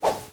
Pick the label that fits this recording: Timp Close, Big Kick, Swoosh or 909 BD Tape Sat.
Swoosh